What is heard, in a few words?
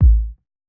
kick; Reference Beat BPM-130; Pophits